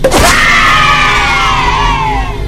birthday party headshot; halo

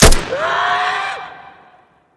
death shout distant

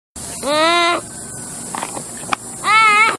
screaming sloth; vine

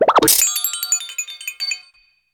quick warble sizzle

